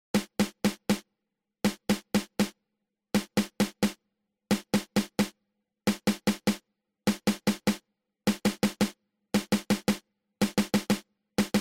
Single Stroke open close open

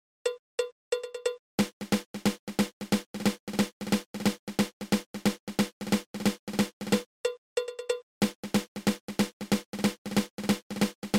Drag

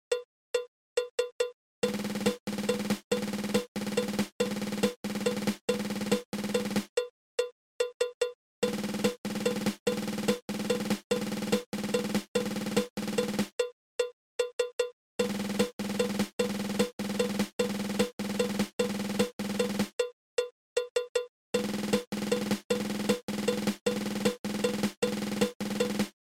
Nine Stroke Roll

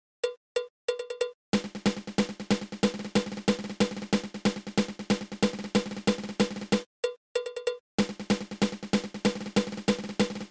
Single Drag Tap

